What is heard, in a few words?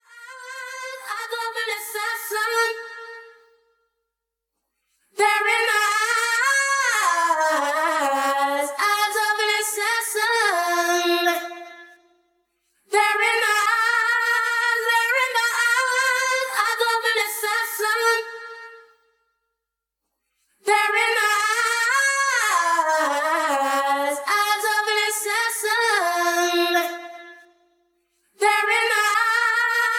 acapella